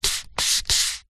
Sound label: food drink coffee cup disposable plastic lid turn slide on cup